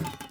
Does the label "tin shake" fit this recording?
Yes